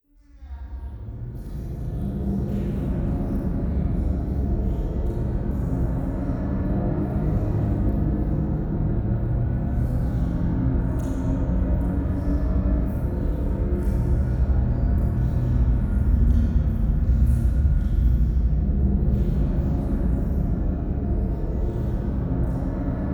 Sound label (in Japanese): 廃墟 フェラチオ 車の中